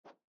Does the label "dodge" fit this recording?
Yes